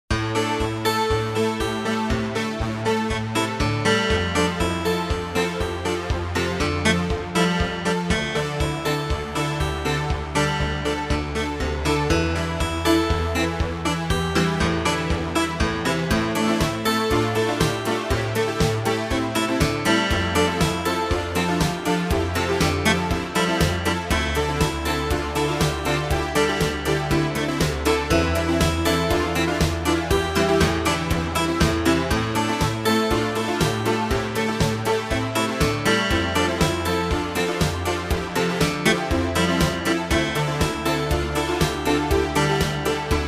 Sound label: Jingles; 90 DDD Jingles